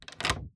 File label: Household Closet Key Insertion Stereo; Essentials Series NOX SOUND; Sample A Sound Effect